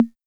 Tom Hi; Drums

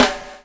BUBL, BUBBLE; Spectrasonics - Backbeat

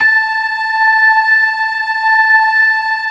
LO MAN V L, ORGAN LOWER MANUAL

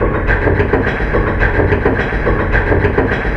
MACHINES

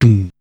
"Tuunn", Single Hits

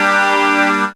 synth g, Techno Chords

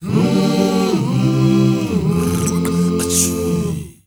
HUMMR, MMM CHRDS

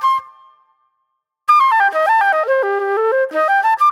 Club Flute

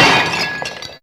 Shatter, Fairlight CMI IIe

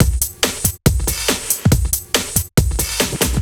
Break Beats, 140 BPM

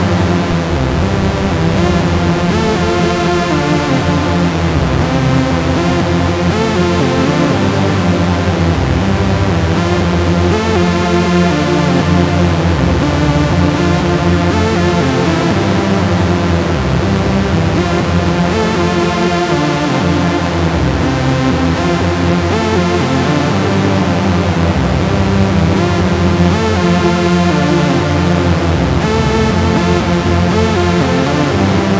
Lead, Modular Electronica; Sonic Foundry (Sony Creative Software); TSS Autopilot

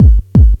DRUMLOOP, Ragga & Dub Reggae

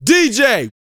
VOX, Vocal, R&B Dance, HIP-HOP

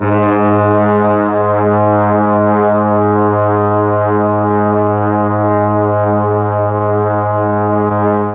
G#2 BRAS, Mellotron; Mike Pinder - Mellotron Archive CD

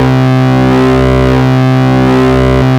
OSC BASS, FAT PWMS; Zero-G Ambient 1

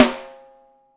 WOOD, Living Drums; 6X12

